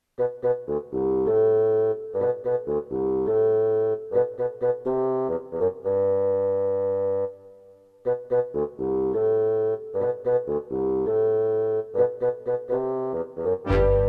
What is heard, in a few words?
Orchestral